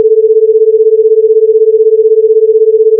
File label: beats 16Hz diff